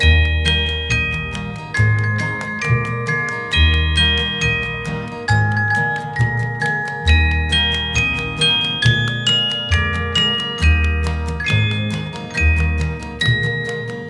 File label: MUSIC